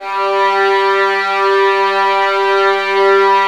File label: Virtuoso Strings, VN TRM; ILIO; Kirk Hunter